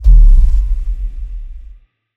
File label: planet generate; starruler; artifacts